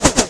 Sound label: bullet bys; Weapon-Explosion